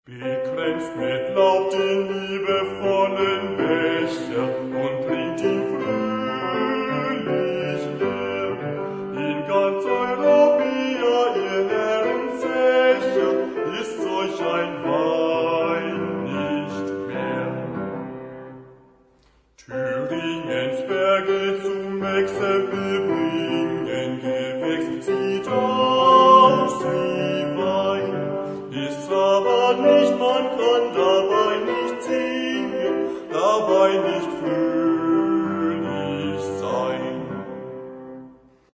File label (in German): Rheinweinlied